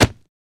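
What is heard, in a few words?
Hit Soft Single, cloth movement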